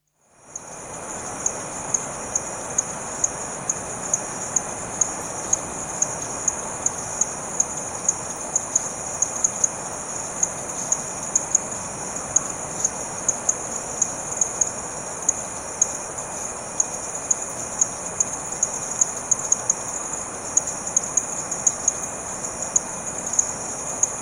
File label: Frogs and insects,late evening ,Maranhao (village NE coast,Brazil); Outdoor Sound Effects; Atmosphere Evening; BBC